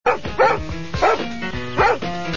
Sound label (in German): bellt